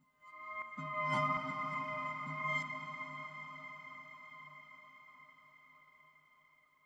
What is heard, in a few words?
Overlay Tone